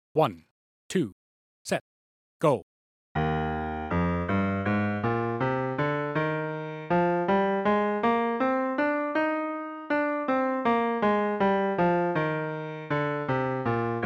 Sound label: Bb 2 octave low 80bpm, two octave scales